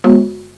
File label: conga drum, percussion instruments; Sound Effects for Multimedia - Volume 1